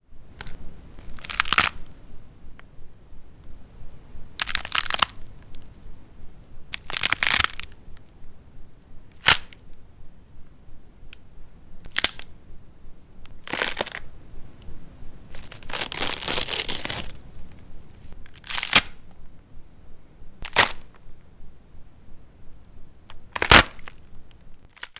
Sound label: real fire